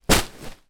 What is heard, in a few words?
office packing peanuts in large plastic bag down on ground; zapsplat